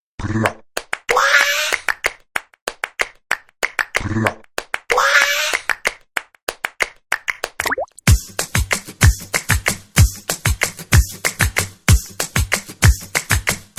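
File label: background music Telugu Ringtone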